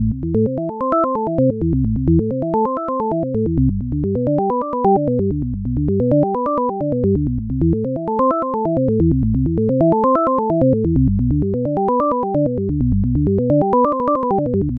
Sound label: Synth Original